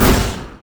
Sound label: arcane barrage; LoL; ironforge xerath